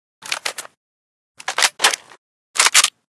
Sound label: l85 reload, weapons